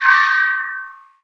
Pickup Magic; SFX Kit; Pickups

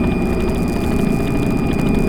Machine Generator Loop

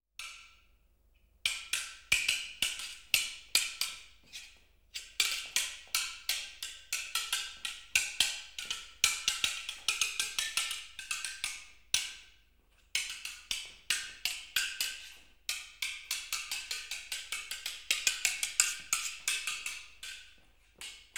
Swordfight General Atmosphere; KMP Film Video Sound Effects

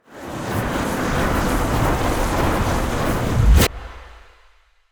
Ice Spell Freezing Slowing Freezing Gust; Arctic Aura

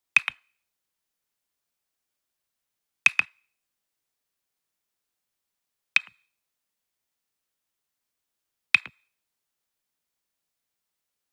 Wood Percussion Wood Block Japanese Double Near; boom library; Cinematic Strikes Construction Kit

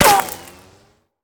Bullet Ricochet; Sound Ideas; Guns

